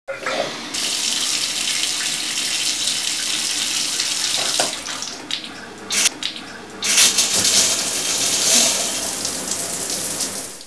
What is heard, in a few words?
Shower, Household Bathroom; KABOOM!